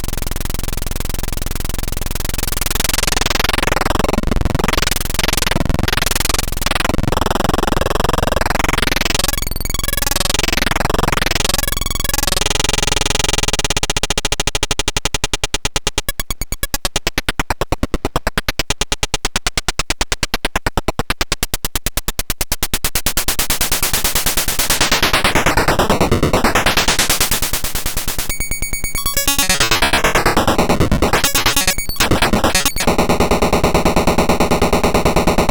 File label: noise test, 2A03